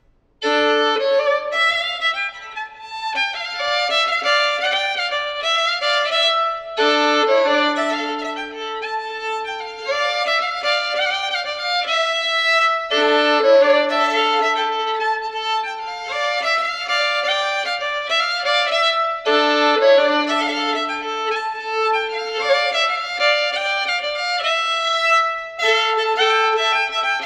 polska; TrosKari Erik

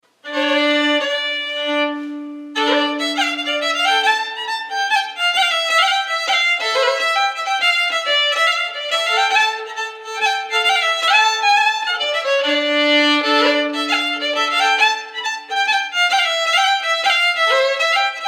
Runnom; Trevaren; efter Embret Lund

